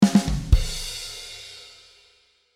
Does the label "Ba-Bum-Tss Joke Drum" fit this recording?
Yes